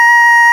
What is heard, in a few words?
ALTO, CHORAL; FAIRLIGHT CMI IIX